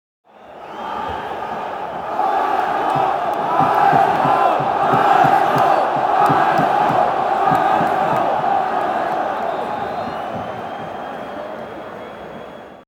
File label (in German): Stadion Fans und Gesaenge